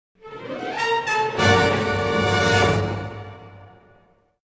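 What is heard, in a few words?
Stinger Heavy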